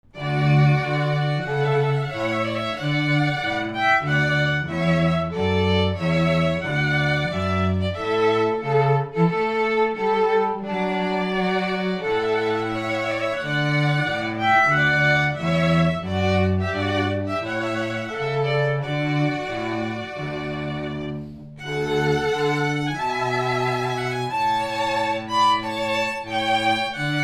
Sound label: trumpet volunta; clarke